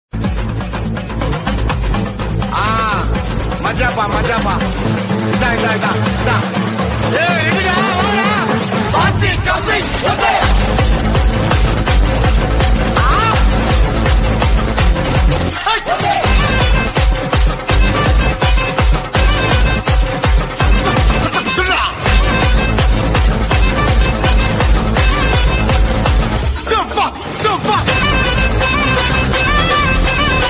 Bgm Tamil Ringtone

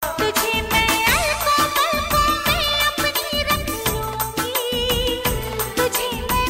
Old Ringtone

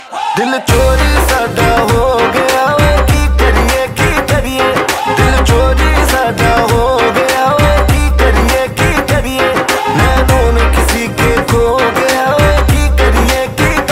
Bollywood Song Ringtone

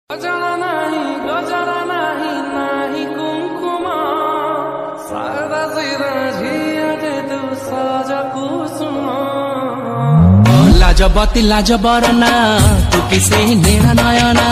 Odia Ringtone

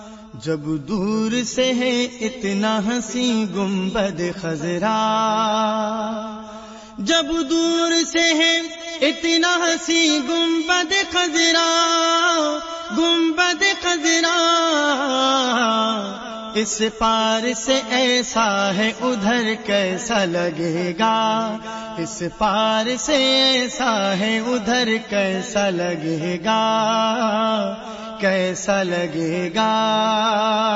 Naat Ringtone

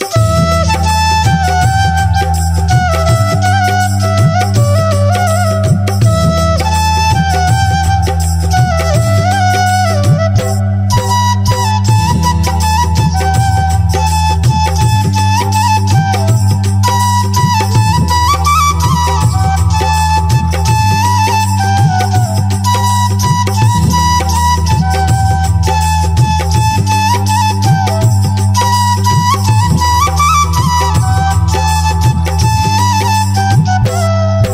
Flute Ringtone

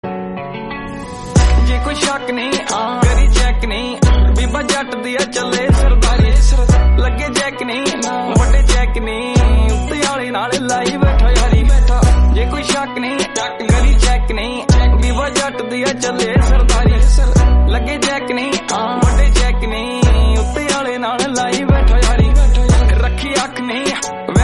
Punjabi Song Ringtone

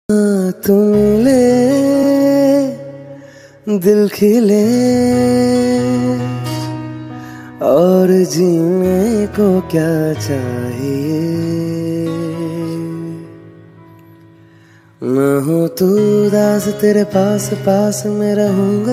Old Song Ringtone

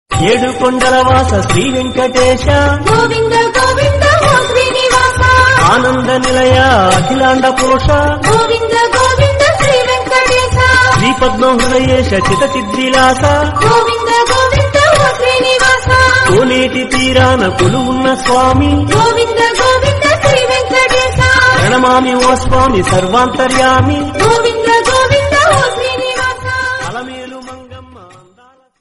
Bhakti Ringtone; Venkateswara Swamy

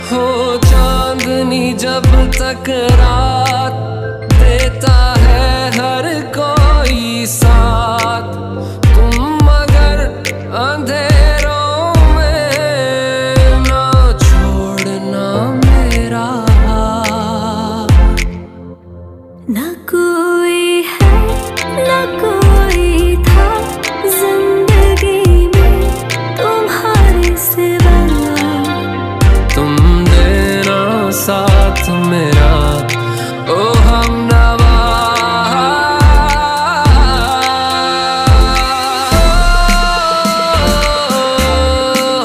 Most Romantic Hindi Song Ringtone

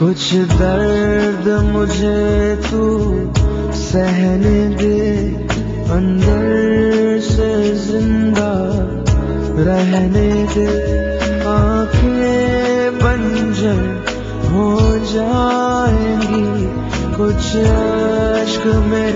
Heart Touching Sad Ringtone

